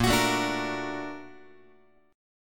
A sharp Augmented Major 7th A sharp down Guitar, A#+M7 chord; strummed; JGuitar